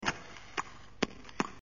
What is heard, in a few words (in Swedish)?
Ritsch puff puff